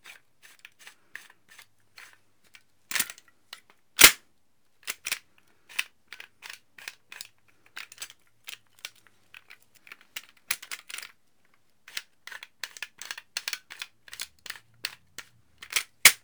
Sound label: Gun Handling Weapon, Weapons Foley; SFX Kit